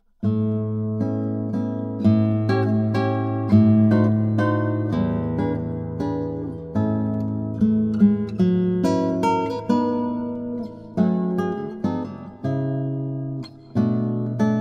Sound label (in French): Accordus vibrant Guitare